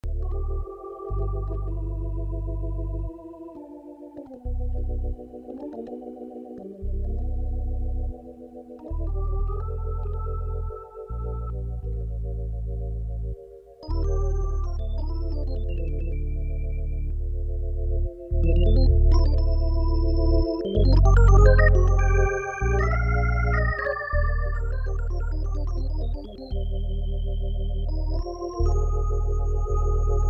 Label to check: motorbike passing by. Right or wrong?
Wrong